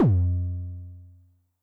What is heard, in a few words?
BD MOD Overdrive High, bass drum, 909; classic machines; 909mod